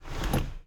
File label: driver foley wheel turn; Blind Drive